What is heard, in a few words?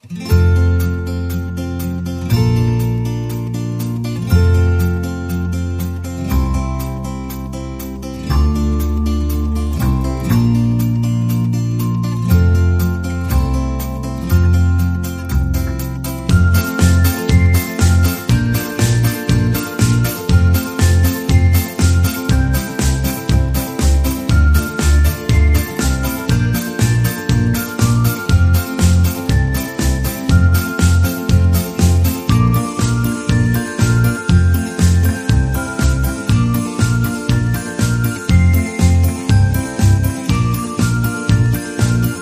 Upbeat